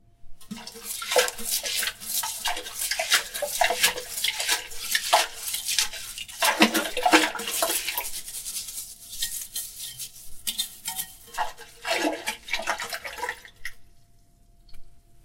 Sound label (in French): toilette